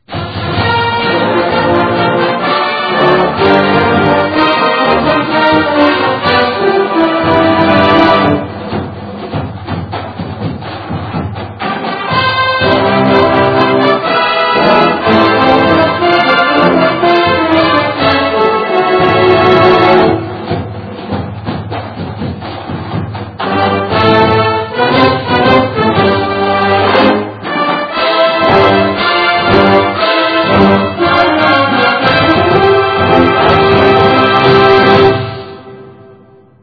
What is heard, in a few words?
Fight Song - Fanfare; Texas Longhorns; The Eyes Of Texas; NCAAF Football